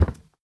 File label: wood, footsteps